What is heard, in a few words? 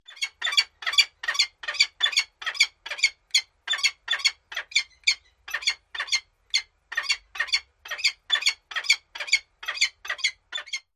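Peregrine Falcon, calls of captive female adult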